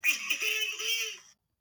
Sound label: he he he e eeee